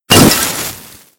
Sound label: Halloween Lantern Destroyed; fowl; Halloween Blocks